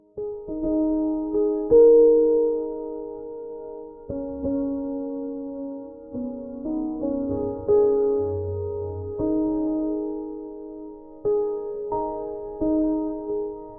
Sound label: solo felt piano very slow; instrumental